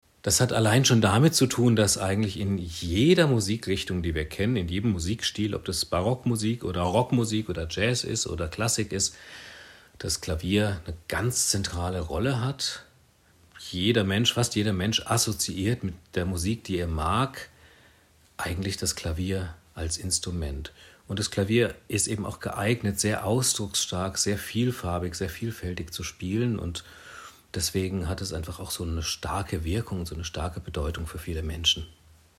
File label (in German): klavier